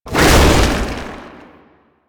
Tank Infested Charge Launch; Enemies; Abilities; Lasrian; Warframe

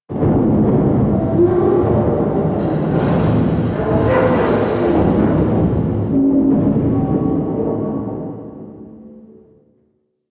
Railjack exterior resonant metal echo; Ambience; Warframe

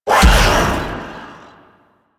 Whirlwind Dash Impact; Warframe; Caliban

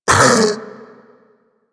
Infested Splitter Split Vocal Pain

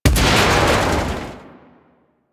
Fan Explosion; Warframe; Ambience; Corpus Gas City; Gameplay Remaster

